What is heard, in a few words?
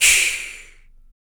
CYMBAL, MALE PERC